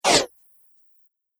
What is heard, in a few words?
Whoosh Swipe